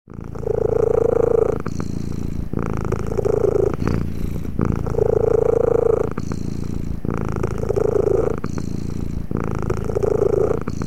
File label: Cat Purring Brighter & Breathy